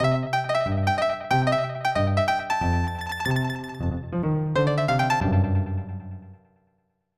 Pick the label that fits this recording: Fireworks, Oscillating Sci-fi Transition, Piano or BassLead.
Piano